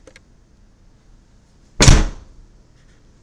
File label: Door Close